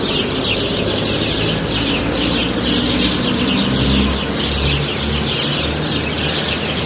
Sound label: birds